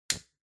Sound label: Lighter Click